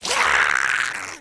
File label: goblin attack